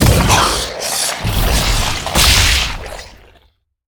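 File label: Guardian attack death, monster; war3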